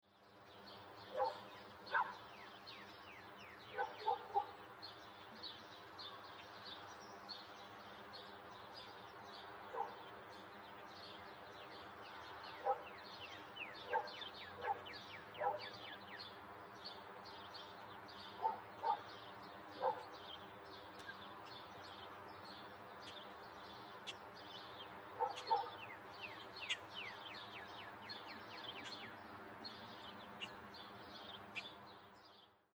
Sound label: Ambience Suburban Day Birds Dog Neighborhood; Outdoors